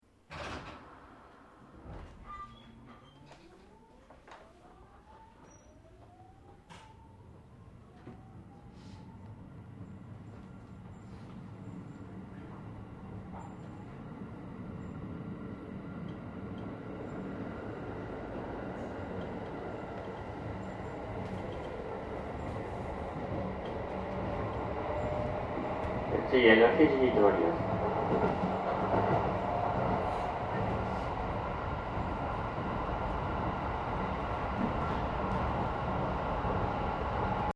Train Regional Local Interior Startup, Japanese Trains; Aomori; Vehicles; Jr